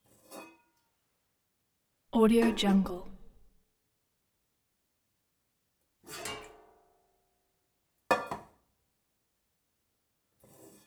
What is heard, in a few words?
efx int tea kettle actions; collanderson